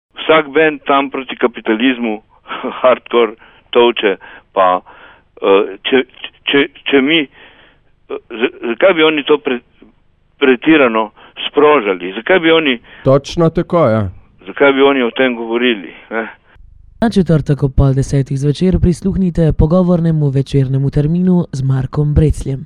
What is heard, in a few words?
jingle